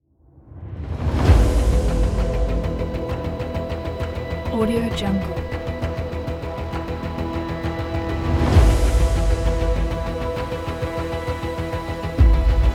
epic trailer; edrecords

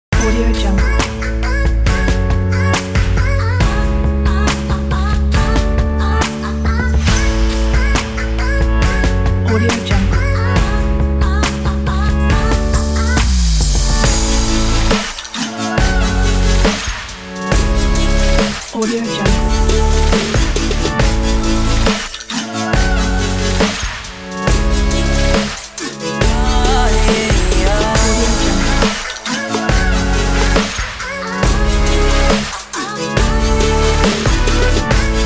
slow motion

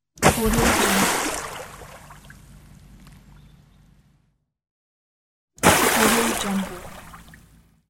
water splash sounds

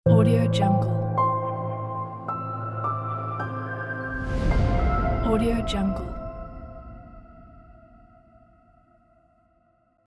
ambient piano logo

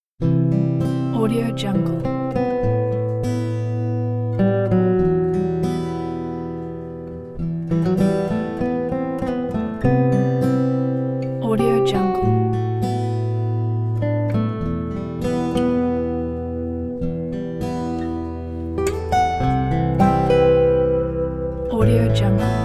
acoustic guitar nostalgia

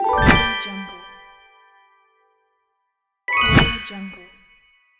the cartoon whoosh

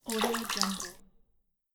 small water splash

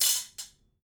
penny in metal bowl; collanderson

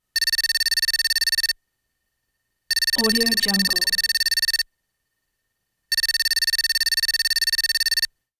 graphics beeps rapid monotone beeps

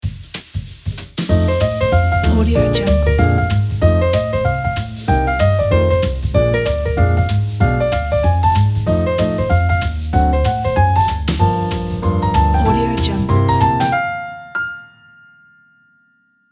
cooking jazz piano logo; noisehoop

